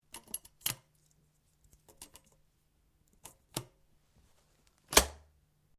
SHOTGUN BAIKAL 12 GAUGE DOUBLE BARREL LOAD SEQUENCE STEREO; A selection of domesttic firearms; Shotguns